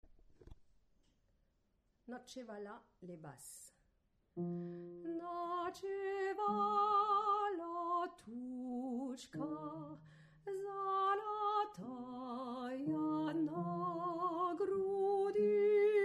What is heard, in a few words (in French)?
Basse; Ночевала тучка золотая